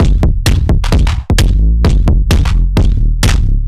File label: Beat VT Echo 130, distorted drum loops 130bpm; musicradar